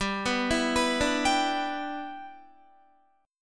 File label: G 6th, Guitar; G6 arpeggiated